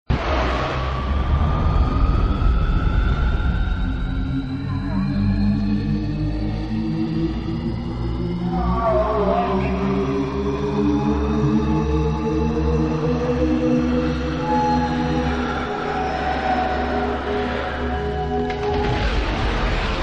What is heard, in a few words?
Thx Audio Test